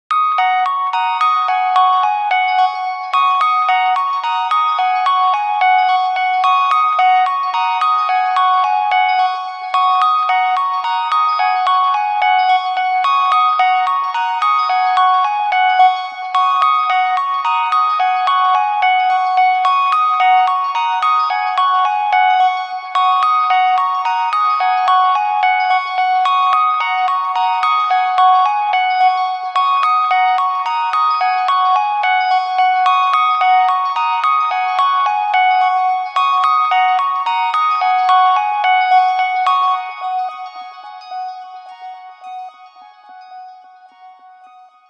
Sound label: piano